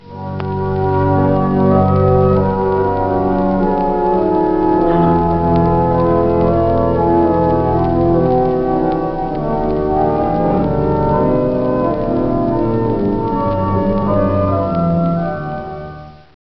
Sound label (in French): les fonds du clavier de grand-orgue (montre, bourdon, prestant, flute...); orgue cath oran